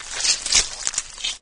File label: melee, flood infection